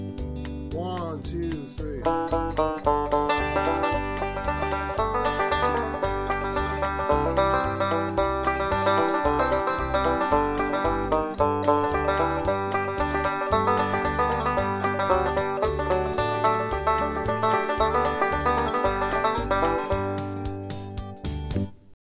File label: Worried Man Blues Stereo, banjo